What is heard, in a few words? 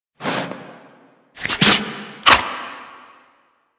sneeze, Kubrow; Enemies; Warframe; Tame